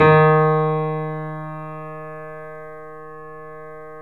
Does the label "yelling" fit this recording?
No